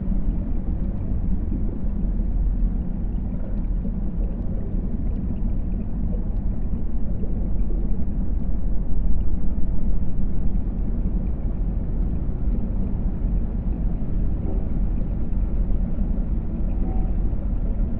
ship colony ship idle; aquatics

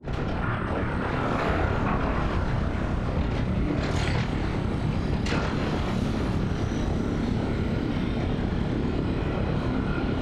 ship colossus open; Stellaris; machineage

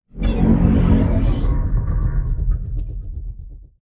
ringworld amb; Stellaris; utopia

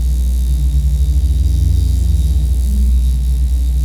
technosphere combat idle; Stellaris; guardians

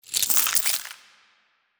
small legs up down; biogenesis

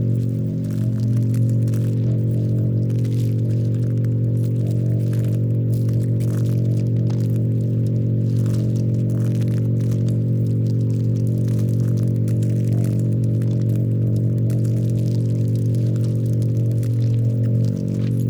pro ship construction idle; biogenesis

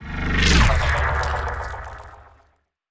pro ship harbinger move; biogenesis

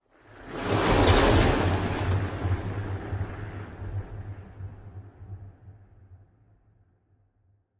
ship battleship move; Stellaris; machineage

